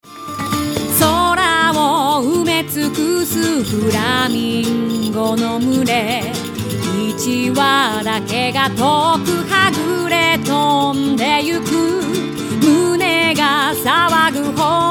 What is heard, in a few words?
flamenco